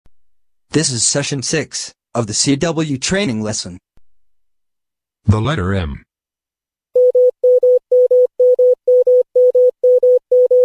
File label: cw training